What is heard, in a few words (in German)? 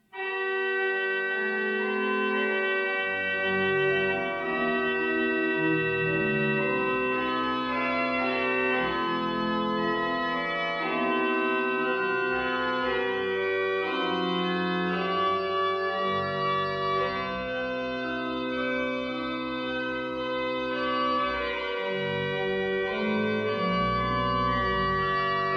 Orgel; Bach; Orgelbuchlein All Praise to Thee BWV 604; BWV 604 Gelobet seist du, Jesu Christ